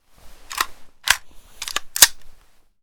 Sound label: M4 Reload; zps; Weapons; Firearms